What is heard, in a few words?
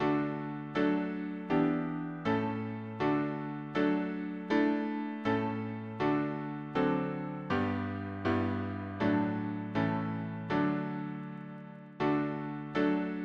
harmony piano